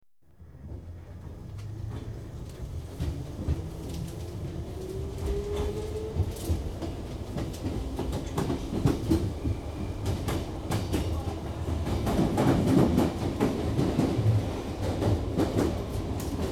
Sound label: London Underground Trains Dist; The BBC Sound Effects Library